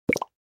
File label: toggle off